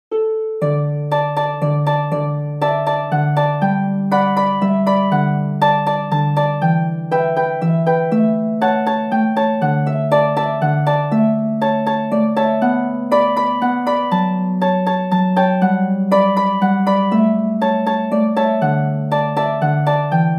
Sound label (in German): Harfe und Melodie; Gloucestershire Wassail